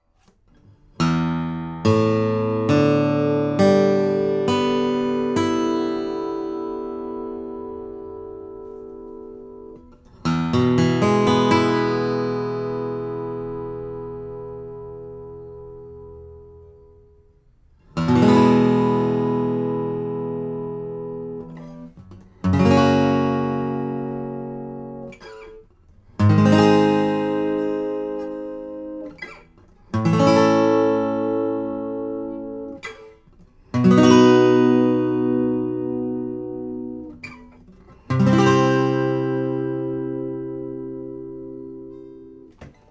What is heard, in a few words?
Sycamore, guitars